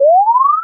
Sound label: menu select; SDL3CPlusPlus